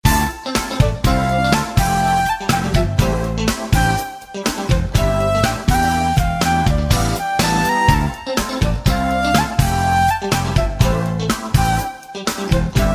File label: bgm